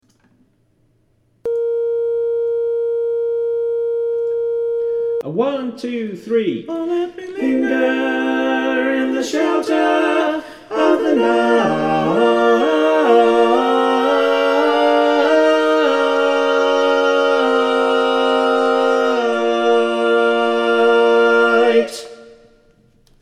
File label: Darkness Full Mix